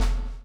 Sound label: CHAIN; Spectrasonics - Backbeat